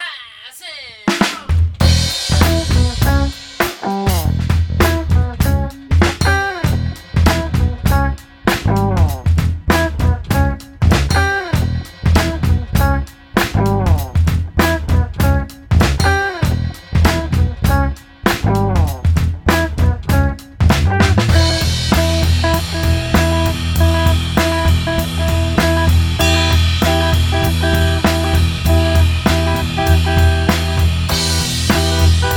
FUNK

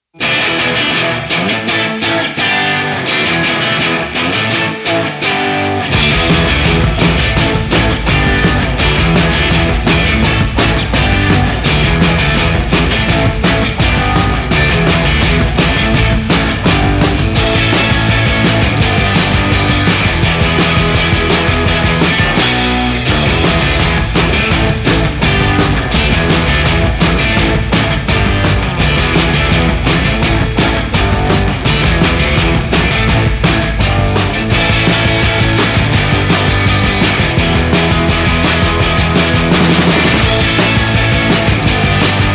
instrumental

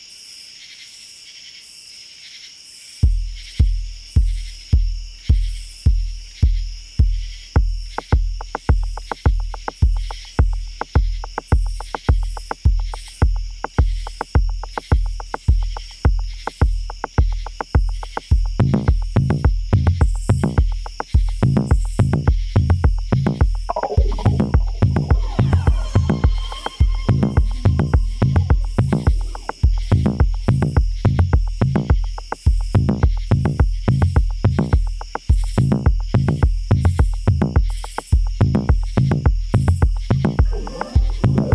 Cicada House

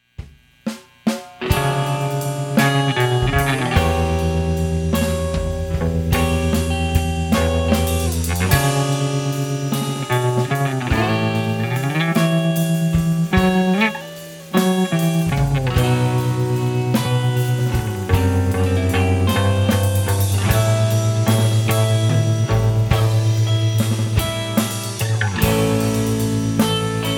Reprise instrumental

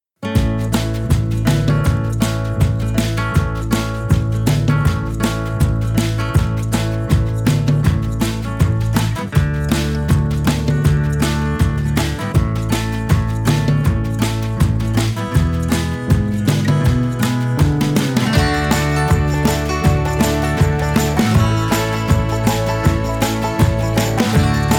instrumental

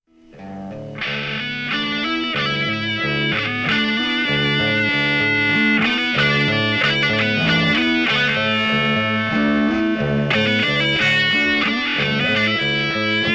Guitars

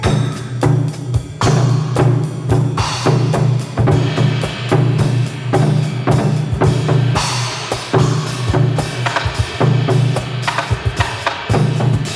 Drums

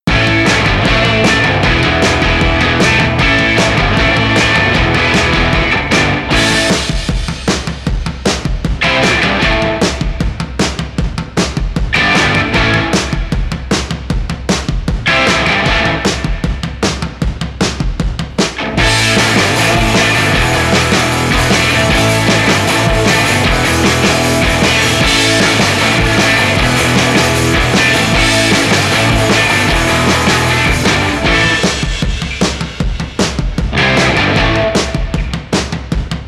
instrumental

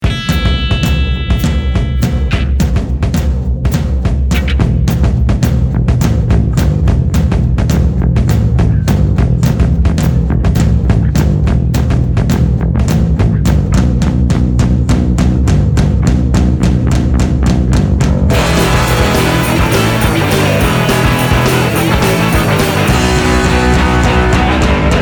instrumental

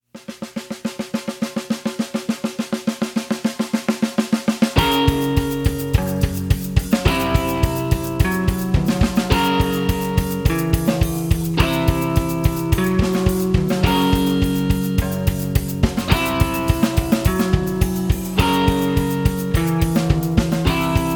Interlude instrumental

